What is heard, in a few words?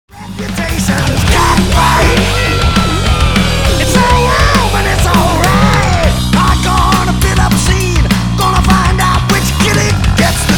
Backing Track